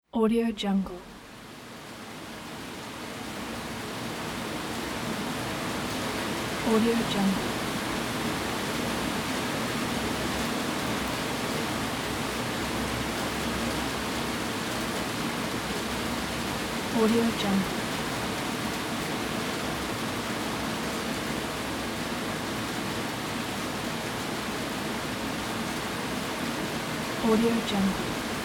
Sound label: heavy rain